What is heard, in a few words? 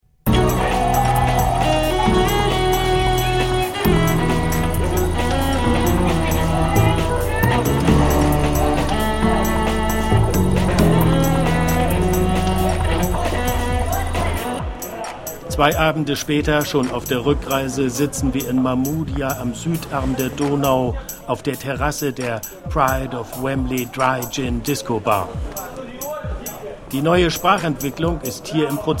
taxi water